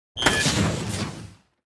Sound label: Sci-fi space door robotic latch electronic